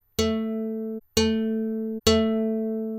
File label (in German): Platte A gezupft, plane Seite dem Mikro zugewandt